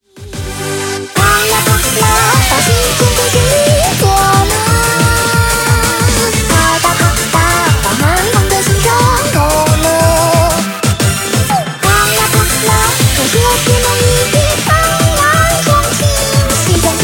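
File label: background music crossfade; Invaxion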